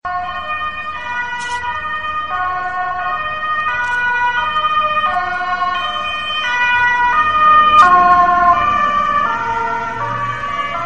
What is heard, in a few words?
dutch ems en police, ringtone